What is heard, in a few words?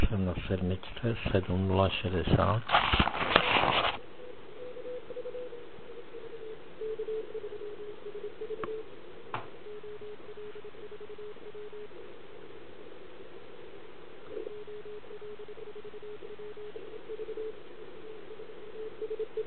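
40m Horizontal Radar; 40m QRM Radar; CQWWCW2020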